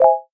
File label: selection arpeggio